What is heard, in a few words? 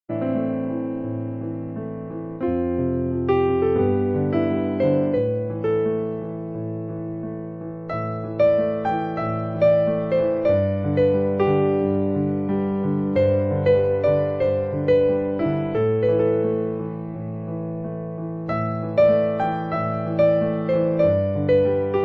Piano Live; Outrun